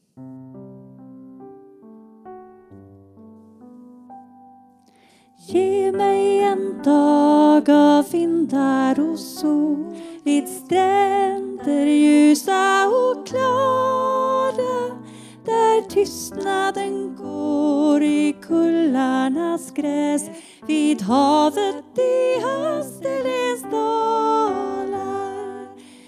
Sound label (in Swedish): Ge mig en dag sop